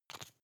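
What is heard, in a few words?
toothpaste close bright, Bathroom; haus-garten; badezimmer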